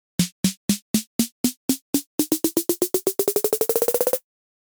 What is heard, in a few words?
ultrabeat 808 pitchbend